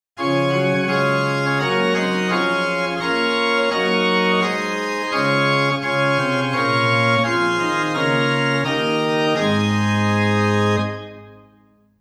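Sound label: Principal 8 + Octava 4 + Octava 2 + Mixtur, organ; Silbermann middle-sized church organ; St. Anne; Hw: Principal 8', Octava 4', Octava 2', Mixtur IV; Reinhardtsgrimma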